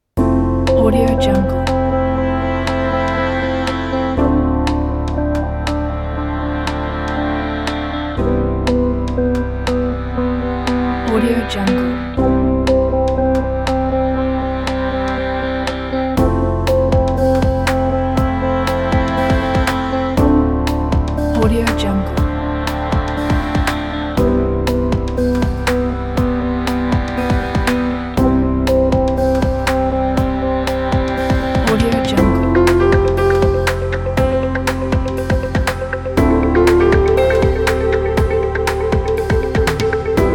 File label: inspirational travel pack; mikekoylo